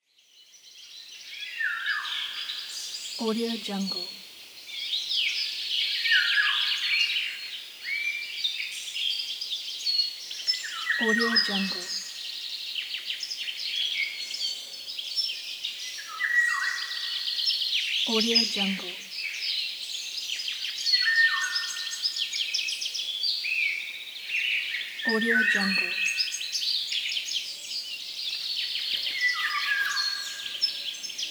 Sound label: birds